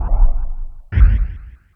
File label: Tool Kit, Drum Machines E-MU Pro-Cussion